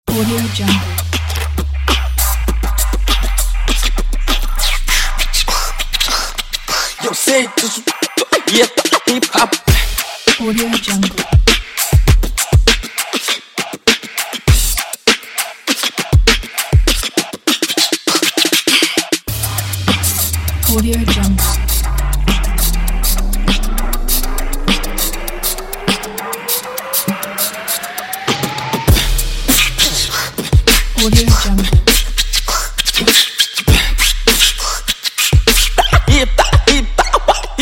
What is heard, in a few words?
beatbox